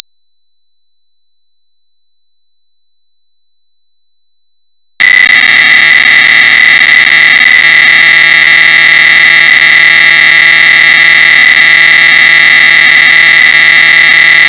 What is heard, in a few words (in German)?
orgel; Sharp Pocket Computer PC-1350